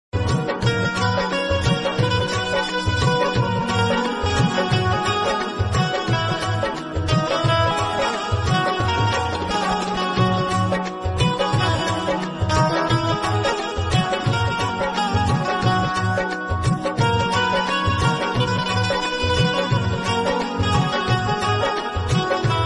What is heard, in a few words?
Instrumental Song Ringtone